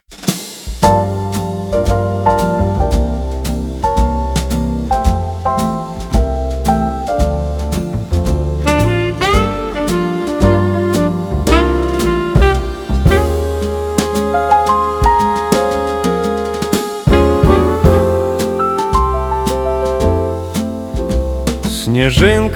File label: Jazz; Новый Год